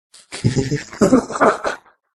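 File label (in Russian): смешок старушки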